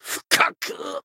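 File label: damaged, Japanese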